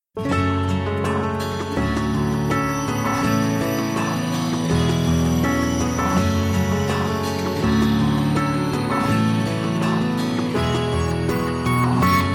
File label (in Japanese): 歌ってみた